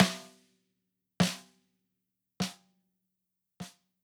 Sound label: Snare Flam